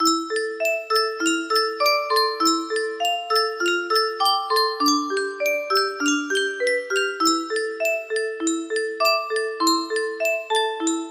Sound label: musicbox